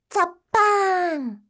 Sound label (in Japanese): zappaann